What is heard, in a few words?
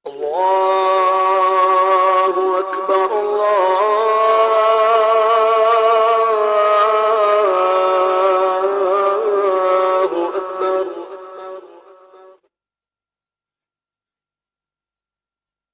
Azan